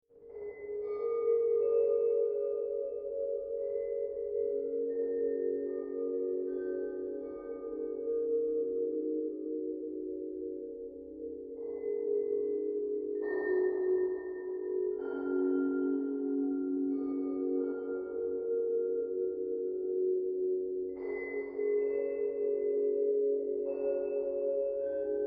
Drone Texture Musical Tonal Dreamy Chimes Bells Gongs Voices; Musical Sound Design